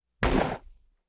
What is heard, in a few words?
face hit thud; prosoundfx